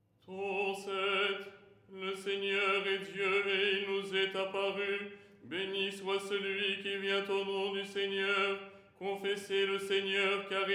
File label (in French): soprano; " Le Seigneur est Dieu… "; Les 8 tons en français